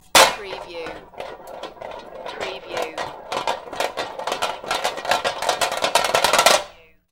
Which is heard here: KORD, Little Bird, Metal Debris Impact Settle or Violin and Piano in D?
Metal Debris Impact Settle